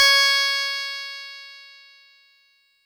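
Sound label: Fuzz Clav DX100 C#1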